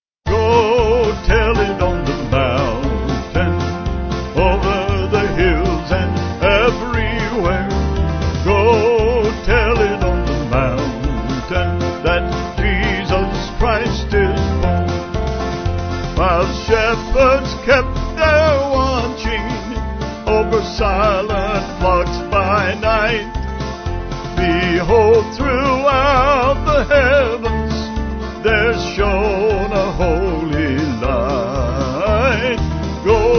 Vocal Band

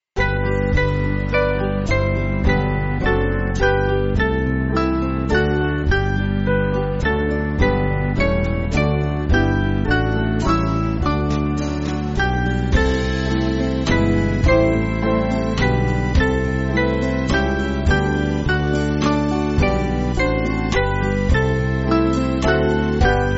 Band

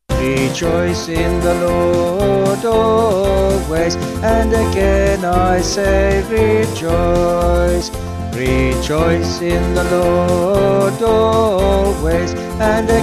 Vocals Band

